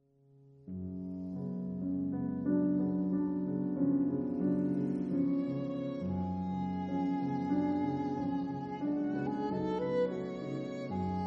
Sonate violon piano

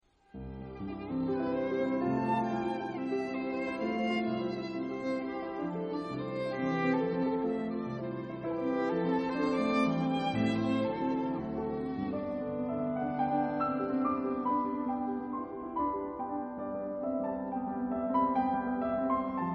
Sonate violon piano; Sonate, violon et piano (Paris : Heugel, 1927); 2. Véloce

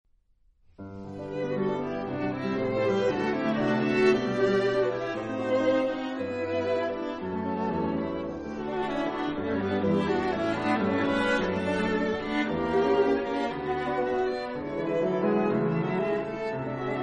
Quatuor piano

